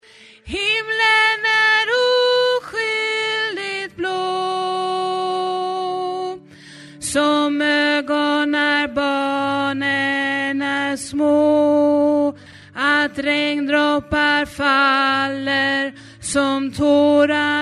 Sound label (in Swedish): bas